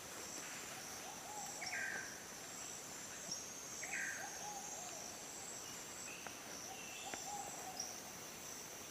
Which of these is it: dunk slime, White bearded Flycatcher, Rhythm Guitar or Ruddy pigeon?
Ruddy pigeon